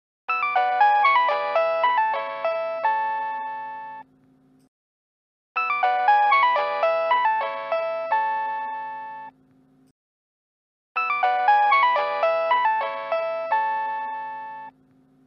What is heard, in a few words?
Ringtone Nokia; Jadul